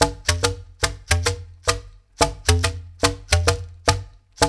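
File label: 108 bpm, conga; Spectrasonics - Supreme Beats - World Dance; Patum Conga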